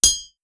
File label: Rhythm Whoops; super crazy rhythm castle